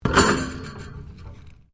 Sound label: MECHANICAL CLANK RUBBER METAL; Clanks